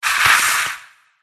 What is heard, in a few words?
scorpion attack voice middle